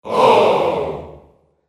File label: audience disappointed ooh aww